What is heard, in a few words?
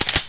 QBZ bolt pull; weapons